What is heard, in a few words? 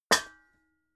pots hit soft